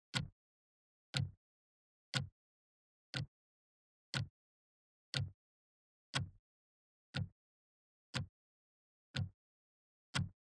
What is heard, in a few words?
CLOCK